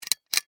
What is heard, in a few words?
Light Pull Chain, Foley, Light, Switch, Pull, Chain; Lights (Sound Effects:Household); BlastWave